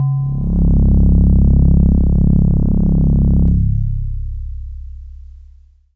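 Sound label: Yamaha DX7 Chime String C1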